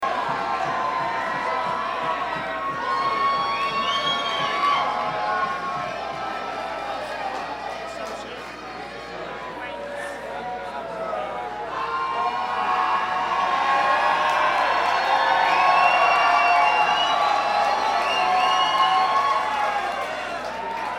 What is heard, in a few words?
Crowd